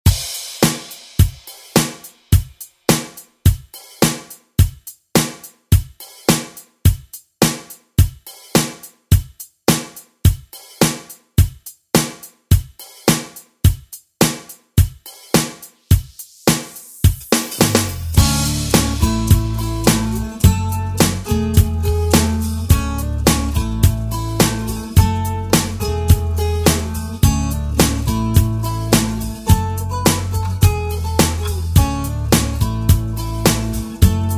80s Re Drum; DJ Mhark

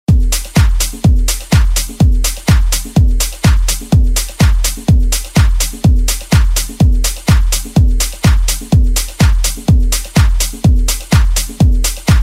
house redrum

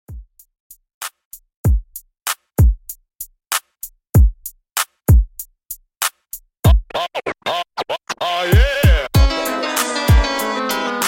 Old School Redrum 96Bpm

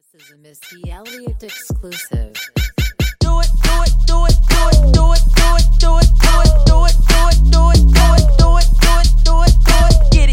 Clap Redrum; Remixfreakz

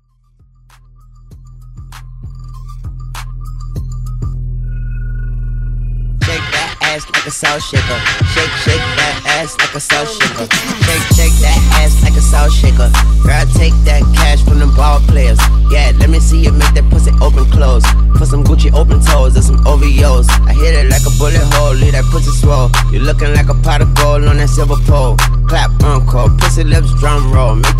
Party Break

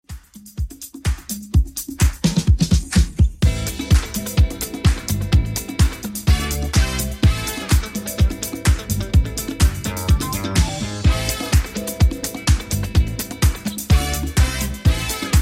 80s Redrum 126 bpm

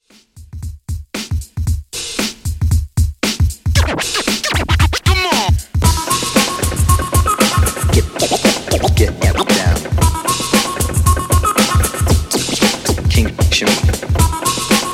Old School Redrum 115Bpm

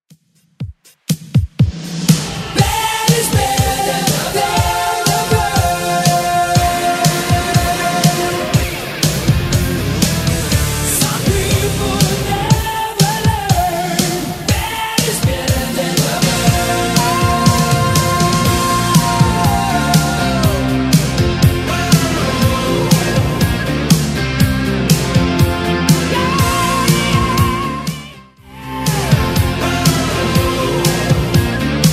80s Rock Redrum 121bpm

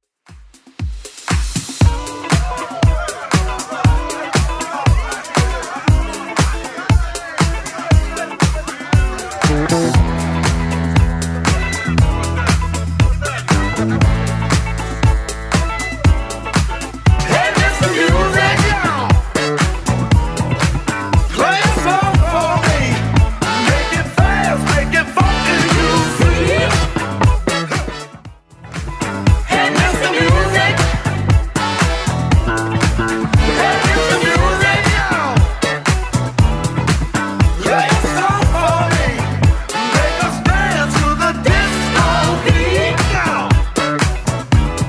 80s Club Edit 118bpm

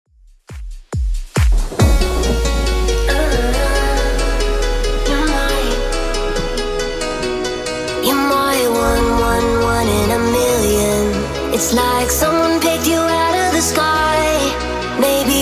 Top40 Redrum 138bpm

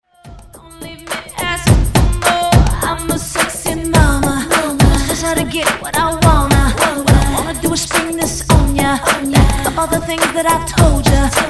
105Bpm

